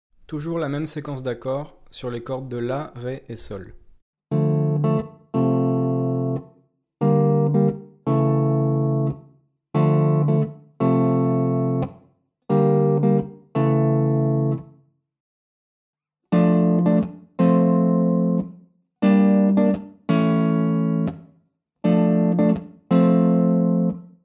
triades

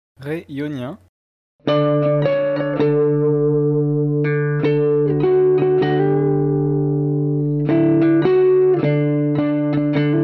modes impro Bourdon

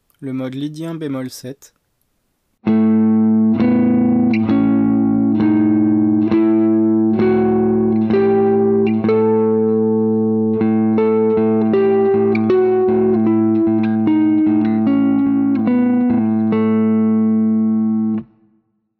single String lydien b7

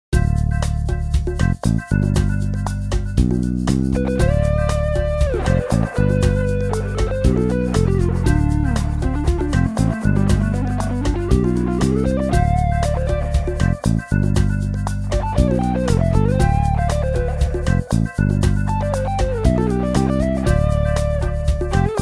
ex impro dorien

